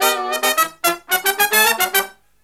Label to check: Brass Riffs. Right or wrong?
Right